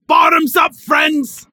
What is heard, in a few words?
Bar Cheering when drinking